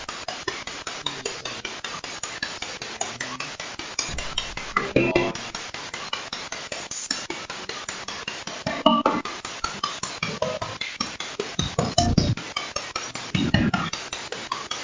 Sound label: Train Station; Mars Train Station – Return Investigation 2024